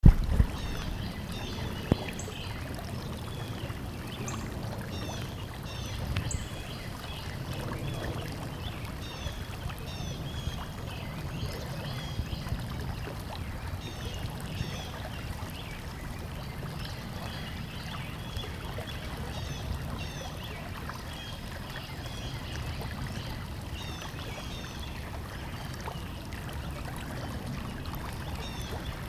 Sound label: atlanta birds; Short field recording of birds and the water at South Fork Peachtree Creek